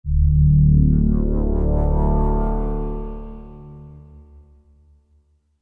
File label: Drone Alert